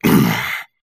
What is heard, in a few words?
zombie death; mobs; Mineclonia; mobs mc